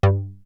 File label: Bass; ZERO G - Datafile DISC 1